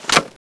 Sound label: speargun reload; weapons; speargunm